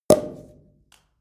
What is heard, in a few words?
sparkling wine cork open hard